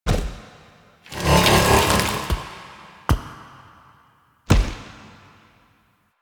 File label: Idle Noble Throwing; Warframe; Warframes; Idles